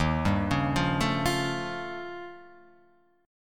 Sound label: Eb 9th Flat 5th, Guitar Open F; Eb9b5; arpeggiated; 3,2,1,0,0,0; JGuitar